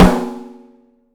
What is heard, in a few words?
OPEN STEEL SN; 3D Drums